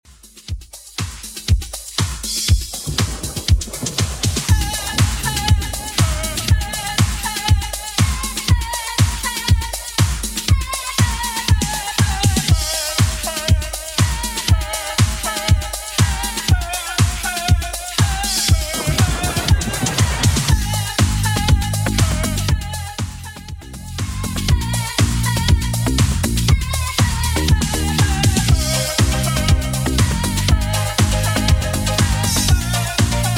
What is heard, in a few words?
80s Redrum 120bpm